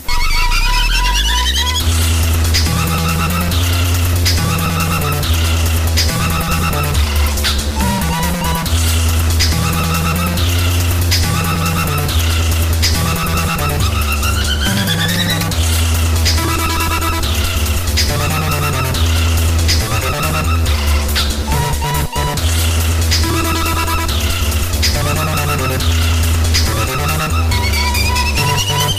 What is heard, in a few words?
birds singing remix